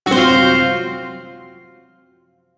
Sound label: Shawzin Hirajoshi Chord; G; Warframe; Duviri Shawzin